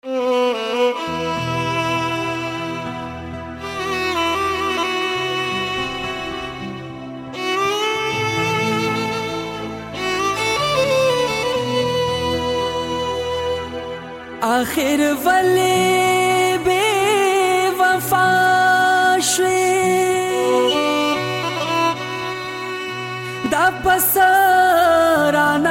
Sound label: pashto sad song ringtone